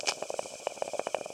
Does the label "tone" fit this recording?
Yes